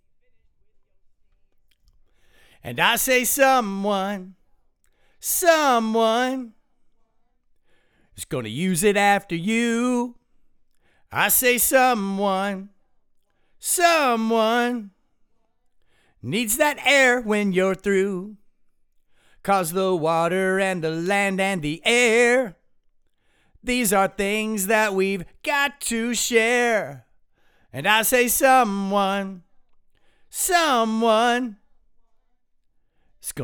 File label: vocals